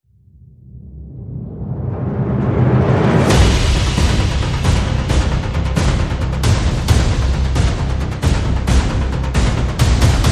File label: Perc And Pulses Only